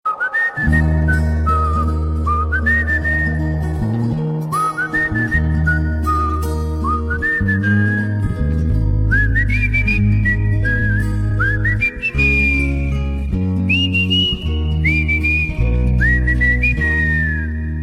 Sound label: whistle melody iphone 6s ringtone